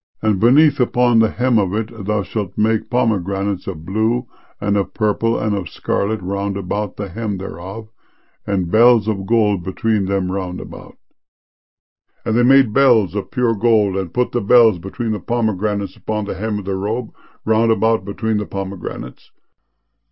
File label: bells